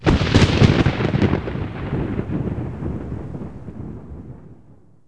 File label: THUNDER